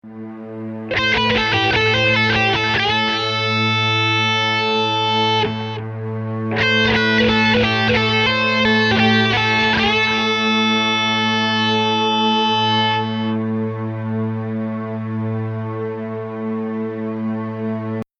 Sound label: Lick, guitar